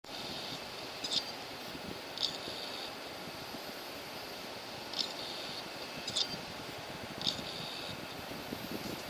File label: hdd